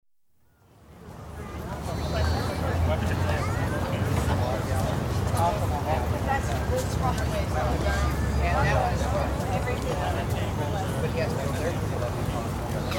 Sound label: Philadelphia: Downtown street atmosphere; City; BBC